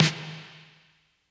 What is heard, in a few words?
80s reverb snare